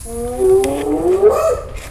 hyena

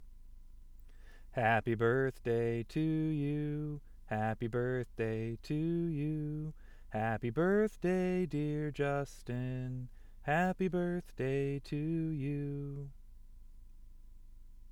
Singing Happy Birthday with lyrics

